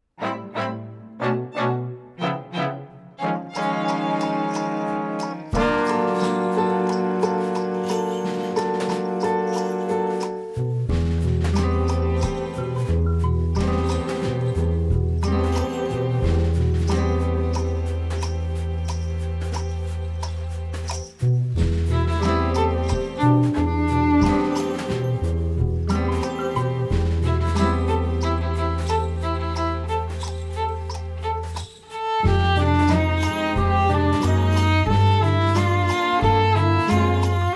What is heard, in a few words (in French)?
TRAINEAU Instrumental